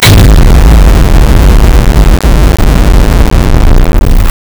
cinematic dark atmosphere, electricity

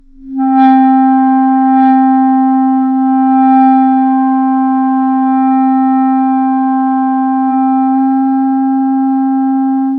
4 notes of pretty popular, woodwind

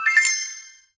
treasure chest open

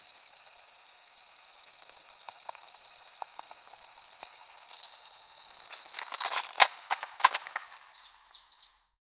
cocoa plants, plants

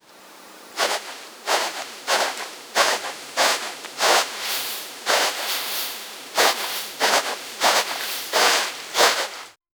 deep breath

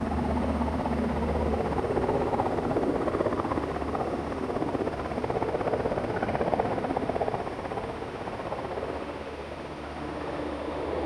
helicopter in flight up

